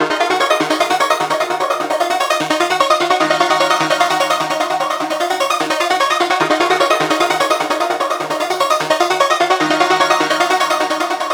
synth loop 150 D#min; holdingpattern; Virtual Riot

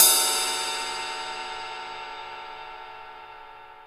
18INCH ZIL RIDE, Ride Cymbal

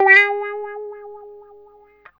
guitar, C guitar

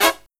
LOW HIT, Brass

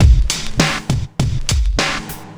Club Tip, R&B Drums

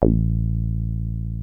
303 A1, TB 303

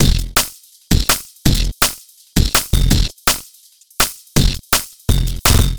Beat, Futurist Drum & Bass; Drums & Percussion

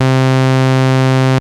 Analogue hits and octaves

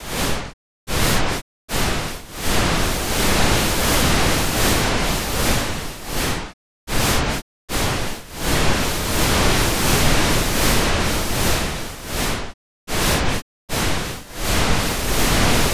NRG SFX, Trance

